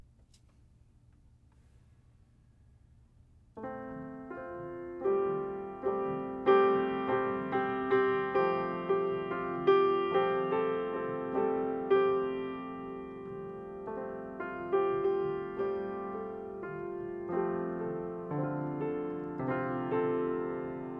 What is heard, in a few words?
F drone